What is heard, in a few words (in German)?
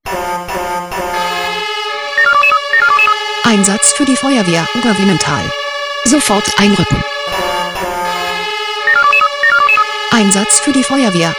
SMS Alarmierungstone; FW Oberwynental